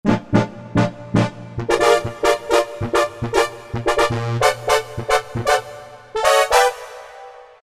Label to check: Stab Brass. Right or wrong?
Right